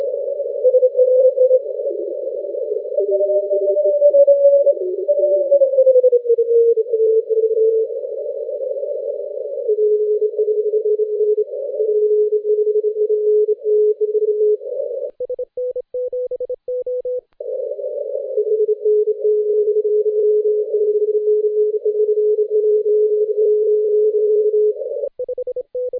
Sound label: CW 21MHz; F5IN; EU DXC